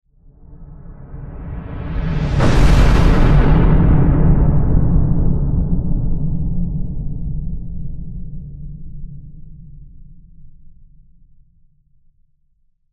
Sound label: Sci Fi Explosion Spaceship; Approach Meteor; Spacecraft